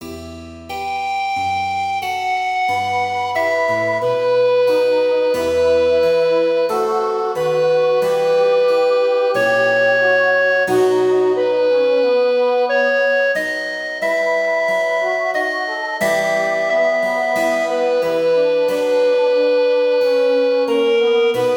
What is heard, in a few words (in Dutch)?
Klaglied zonder tenor; Buxtehude Dietrich